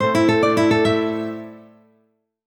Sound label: Jingle Solved